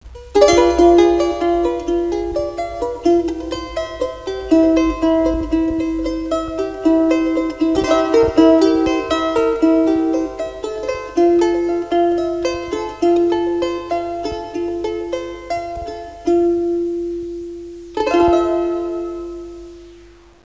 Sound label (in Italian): musica strumentale